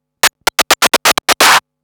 Scratch Fills 140BPM

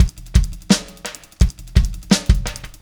Static Beat

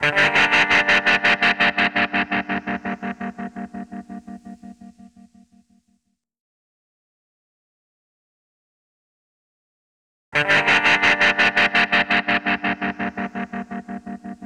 Tremors, Guitars; Zero-G Smoke

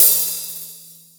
kit, Yamaha Motif-ES8

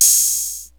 HAT

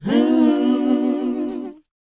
WHOA A, Vocal R&B & Dance; ILIO; Vocal Planet

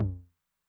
HI TOM, Low Tune Med Decay, 909; classic machines; HT - HI TOM

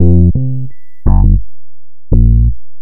Bass, Futurist Drum & Bass

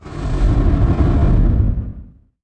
IMP FLAME, Cinematic Impact

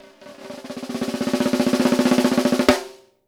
ROLL CRESC, SNARES; Sampleheads - New York City Drumworks VOL-1; SW SNARES

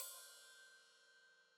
Hat Open, Hi-Hat; Deeply Sampled Drums

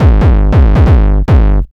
DR 909 140, Hard & Loud Techno; USB Soundscan vol.01; AKAI

